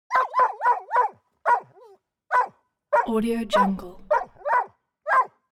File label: animal dog gsp bark sad; smartsoundfx